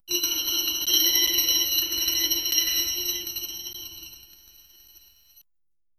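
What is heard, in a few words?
skill windows appear digital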